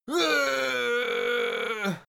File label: goblin die